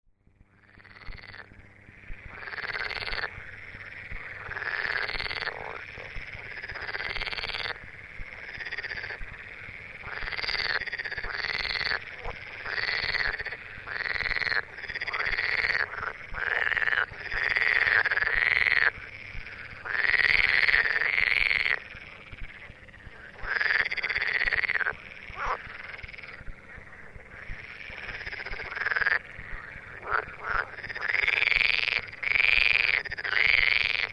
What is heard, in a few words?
Pelophylax esculentus